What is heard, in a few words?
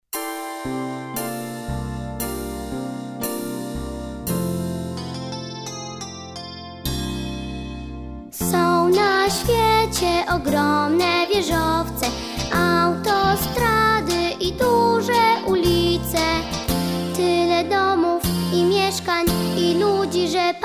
hymn